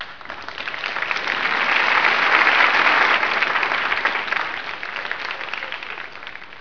crowd

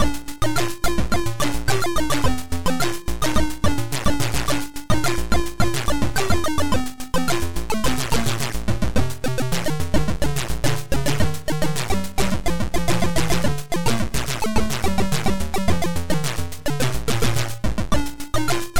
hymn

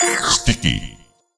pick sticky; Geschicklichkeit; Bricks Of Egypt

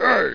pickup; baldies

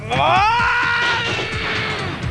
Arrgghhh

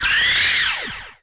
health pickup; Daikatana; items

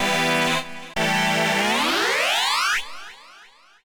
SYNTHPAD, HOUSE, 125, A; eJay; Sphere

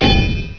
hit wall, weapons; Hexen2

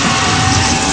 scrape wd

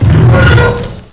SCRAPE; UEBERLEBEN; velocity

